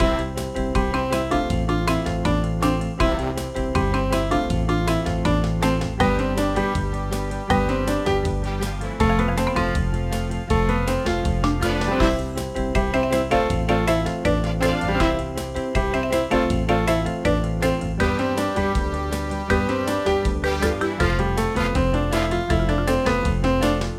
Party Music; benjamin bluemchen ein tag im zoo; nds gamerip

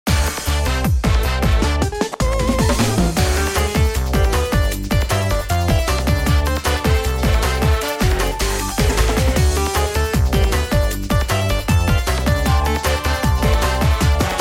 Jump Rope; active life outdoor challenge

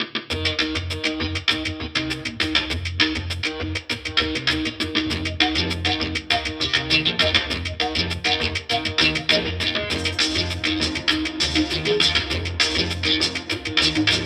MONO SPEAKER; lego star wars the force awakens 2016 ps4; DOBRADOOMBA

